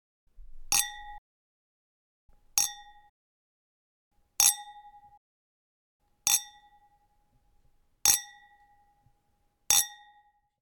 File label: Glass Clinking